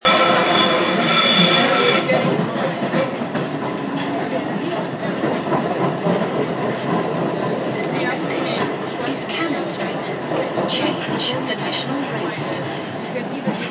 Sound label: tube screech